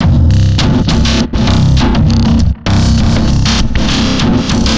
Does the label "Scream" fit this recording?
No